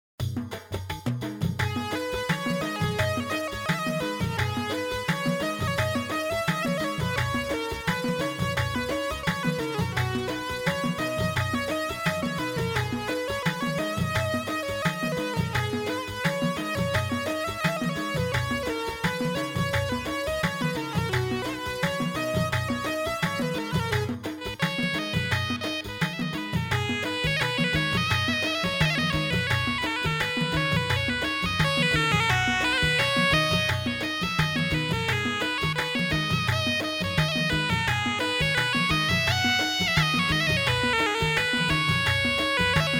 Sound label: KHALIJI LOOP